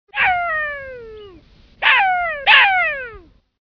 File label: Jackal